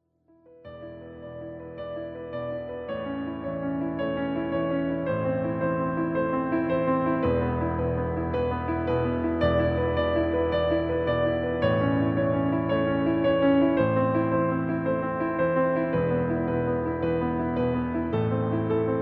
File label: piano ringtone; the last of us